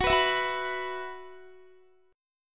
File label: F sharp 7th Suspended 4th, down, Guitar; strummed; F#7sus4; 4E,4A,5D,5G,5C,6F